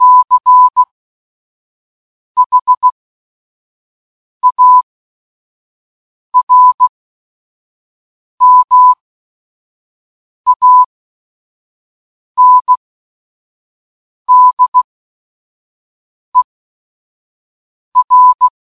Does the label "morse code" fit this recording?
Yes